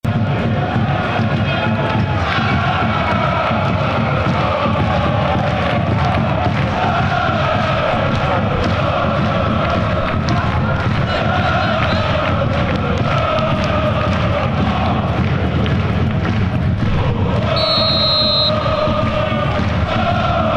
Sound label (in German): Aachen lalalalala